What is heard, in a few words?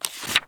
page flip, book and paper